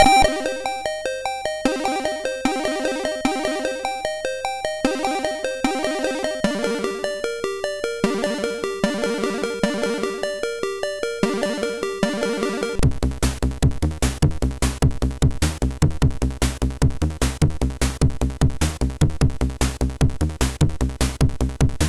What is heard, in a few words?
Improvisation, MOS6581R4